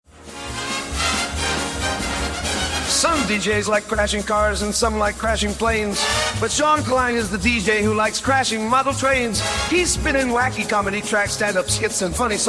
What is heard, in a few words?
train